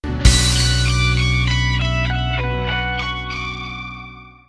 zombie win; cstrike; ZP CRISTIAN

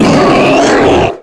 pain; jailbreak

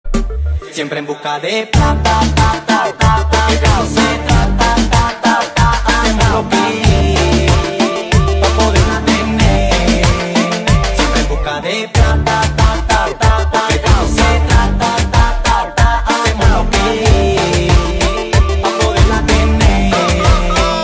music

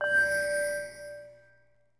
steam connected

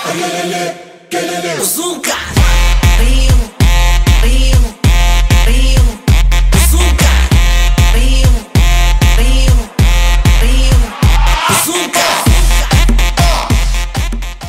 electro; roundsound

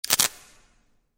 c4 spark

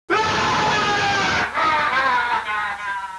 scream

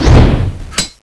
stab, weapons; janus9

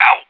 whack smash; cstrike; 35hp ruso

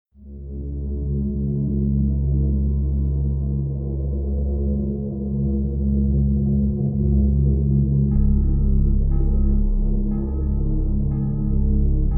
music

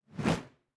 acidtrap knife deploy

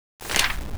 page found

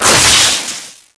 field break

